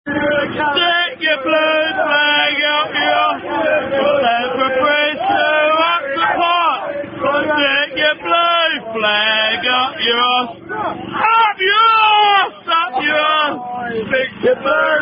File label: Football Chants; Stick The Blue Flag Up Your Ass